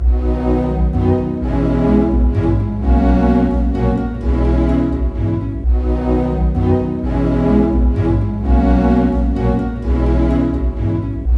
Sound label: HAPPY, ORC Happy Hour; East West - Scoring Tools